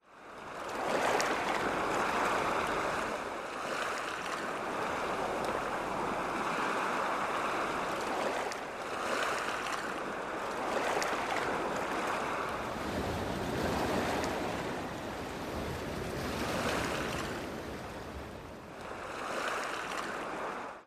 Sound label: beach